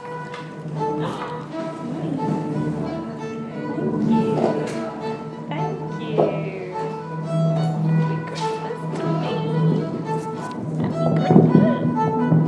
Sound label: xmas party feat korean violin